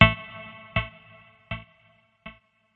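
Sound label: synth echo metronome, 80 bpm; SYNTH AND ELECTRONIC LOOPS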